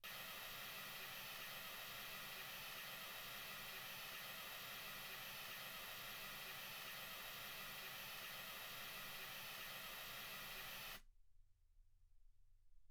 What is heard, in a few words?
mls 48kHz